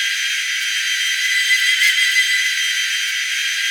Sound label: TRANS OFFHIGH 8000RPM, F1; AOS F1 2022